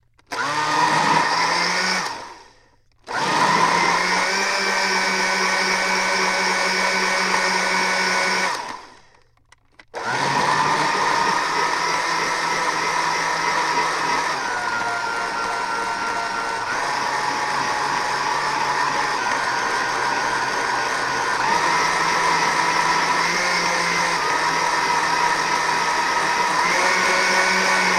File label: Blender Full Mushy